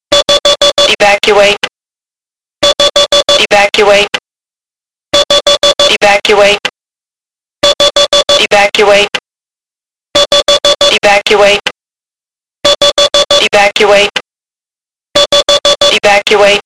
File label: md80 evacuate